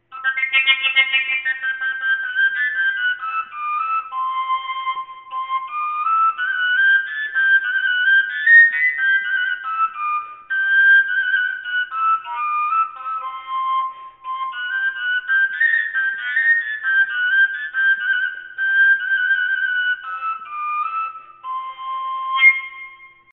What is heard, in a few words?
koncovka c, overtone flute; whistles